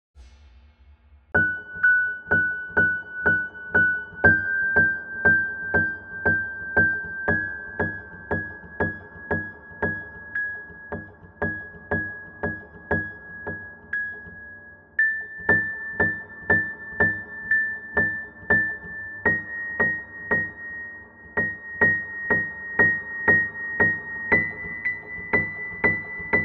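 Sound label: hammer noise test recorded, piano, low velocities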